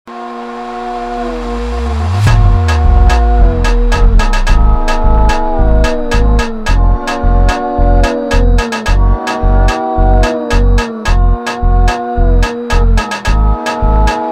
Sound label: instrumental Version Slowed